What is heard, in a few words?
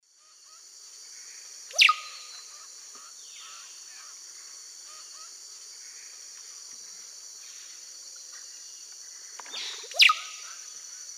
Psarocolius angustifrons, song; S. America; Eastern Lowlands